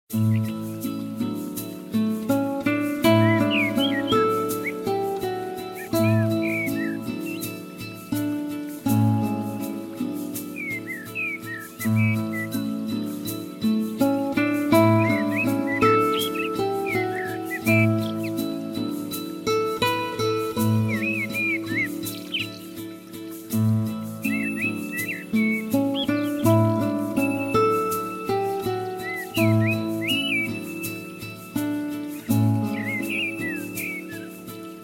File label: Baby sleeping music